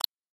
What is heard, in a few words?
item click